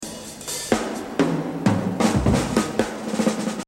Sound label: Exercise, jazz drumming